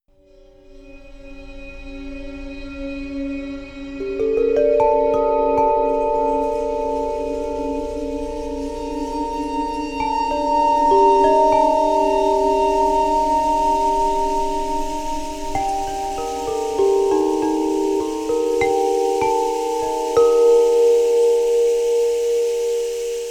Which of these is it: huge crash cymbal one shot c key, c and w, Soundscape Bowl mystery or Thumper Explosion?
Soundscape Bowl mystery